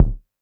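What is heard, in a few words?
vermona drm1 mk3 bass drum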